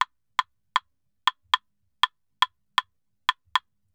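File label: CLAVES SALSA, salsa drums and percussion; Best Service ProSamples vol.14; AKAI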